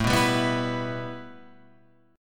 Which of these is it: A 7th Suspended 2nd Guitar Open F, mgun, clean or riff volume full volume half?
A 7th Suspended 2nd Guitar Open F